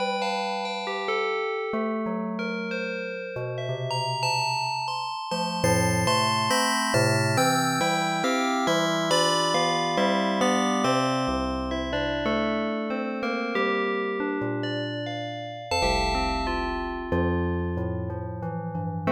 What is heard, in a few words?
Fmaj